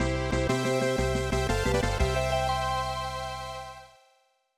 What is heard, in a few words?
Level Up; TNN Bass Tournament of Champions; SNES